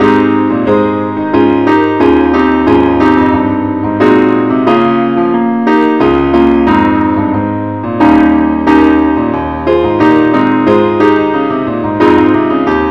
keyboard only

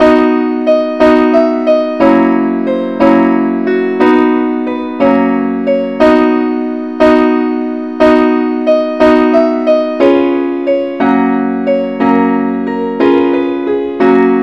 simple acc key B; angelvoices

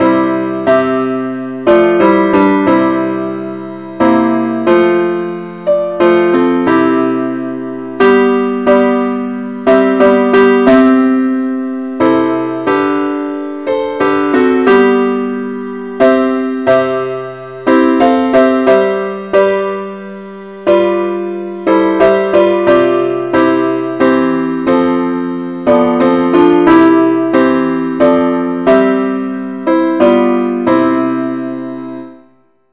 parts only key C

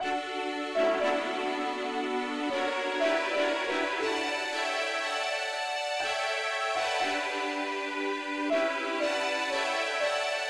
pop uke lead key d

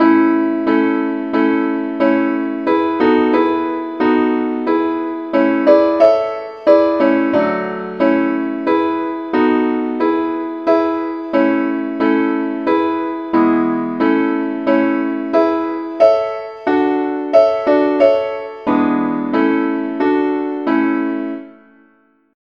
simple acc key a

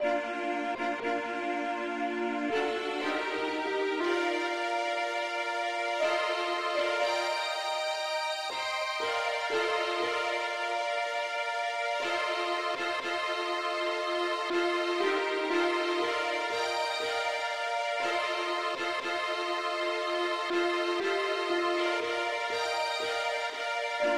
mandolin Lead

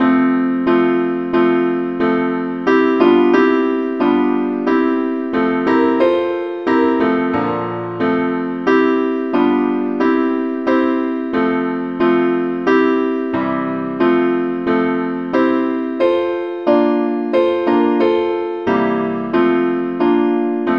simple acc, key F

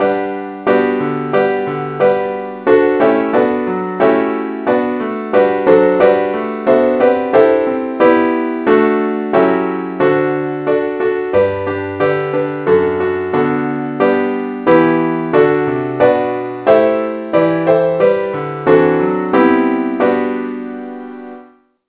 keyboard key g; saintthomas

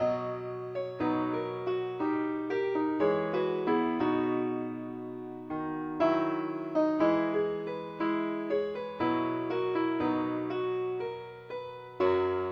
keyboard only key b

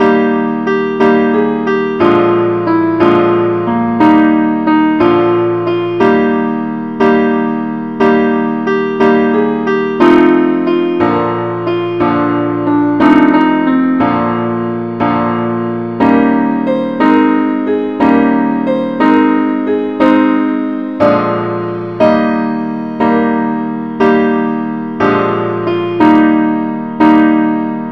simple acc key ees; angelvoices

